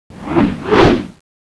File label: shoot; cstrike; weapons; thanatos9